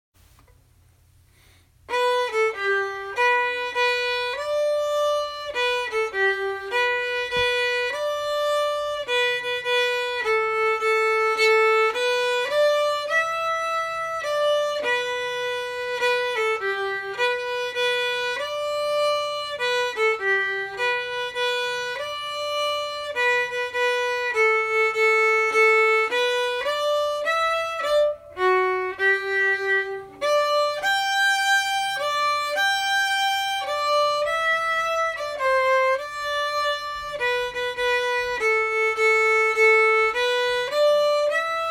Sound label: tombigbee waltz, fiddle